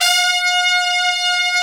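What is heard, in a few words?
trumpets swell; Roland L-CDX-03 Disk 2; BRS Tps Sec.1 f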